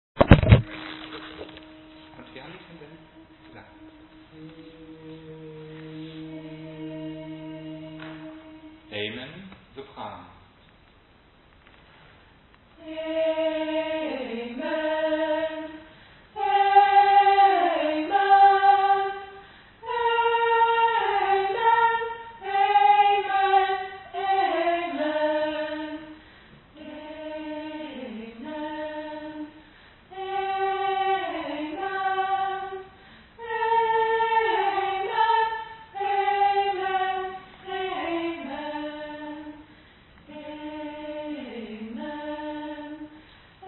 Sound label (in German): Amen Sopran